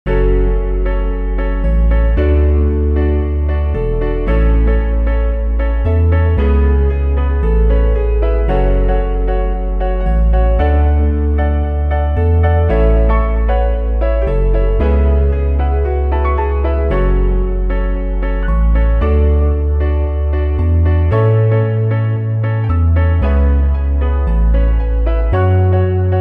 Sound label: Guitar A Major Bpm114; Stems